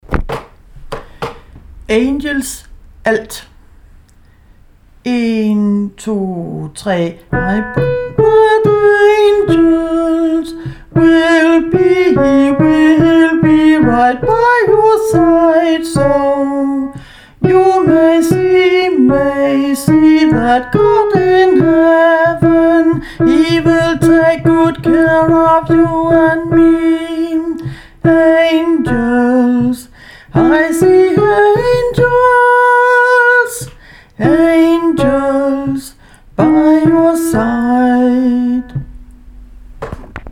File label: angels alt